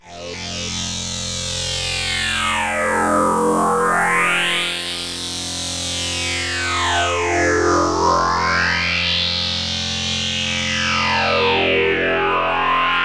Scanned sine no flange

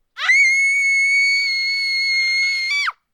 Scream

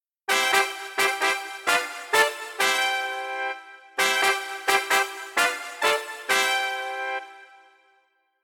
Jolly Fanfares

